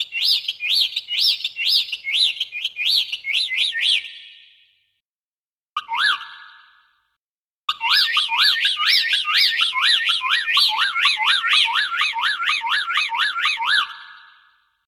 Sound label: bird tweet